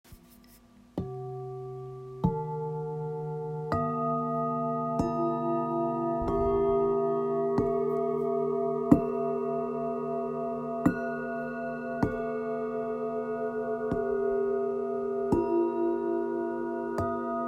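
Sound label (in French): Set therapeute bengale, bols chantants tibétains; jupiter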